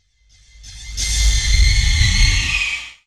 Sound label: Horror iron thumping